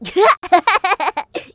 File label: Laugh; Seed; Knight Online